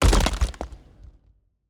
heart damage stone

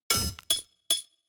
environment successful build stinger

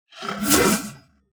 succubus strike; Shelter

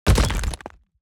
environment room damage stone

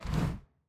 environment mob release all

